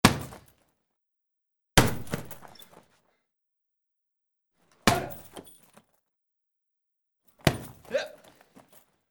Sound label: karate kicks to heavy pad